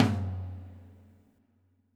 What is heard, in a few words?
FX TOM, Drums Acoustic; USB Soundscan vol.10; AKAI; 90s sample CD